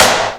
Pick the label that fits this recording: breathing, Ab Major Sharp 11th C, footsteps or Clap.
Clap